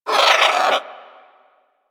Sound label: Bird Tranquillized; Warframe; Hunting Critters; Sawgaw